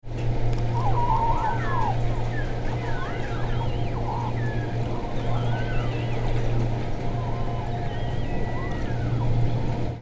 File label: Ship and beluga